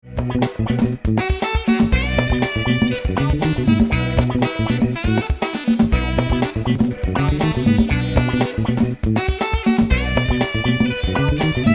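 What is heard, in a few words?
Funk alto, Metal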